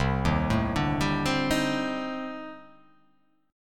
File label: C Minor 6th Add 9th C